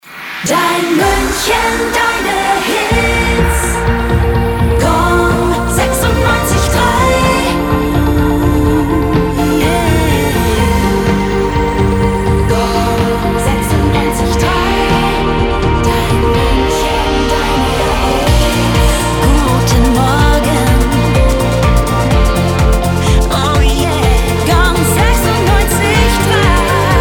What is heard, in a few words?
Main Theme Ambient Rock; Gong963